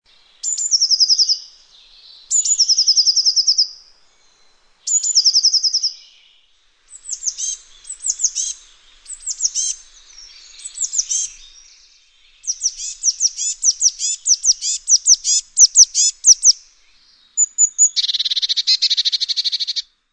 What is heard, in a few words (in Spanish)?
herrerillo comun